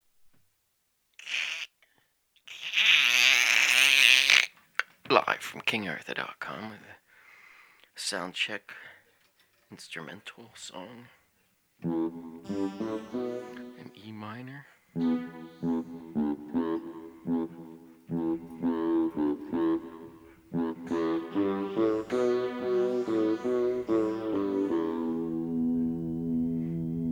Instrumental Soundcheck

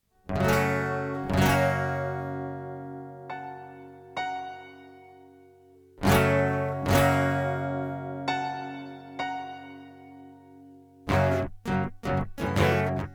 acoustic prelude

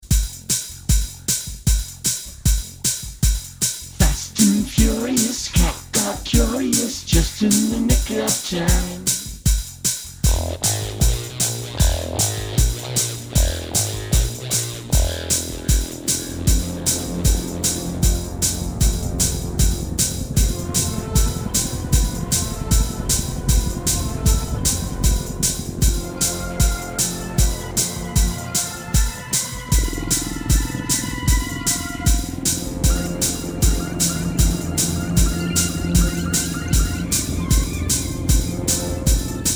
Soundcheck

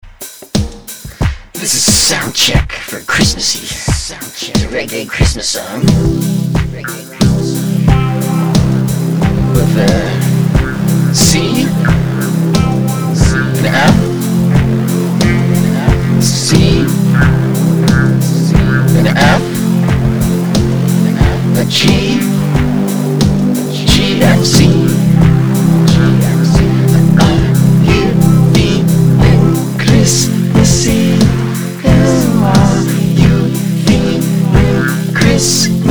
Christmasy Soundcheck

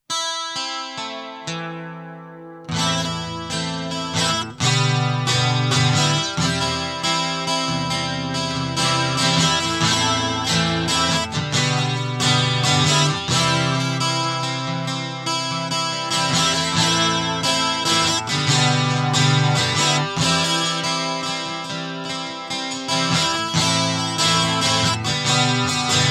Guitar Loop

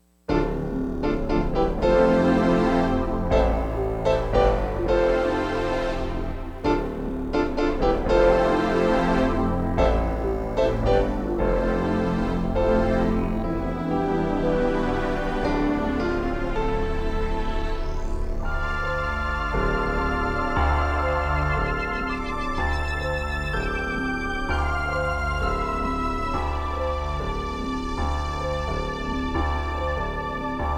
piano prelude

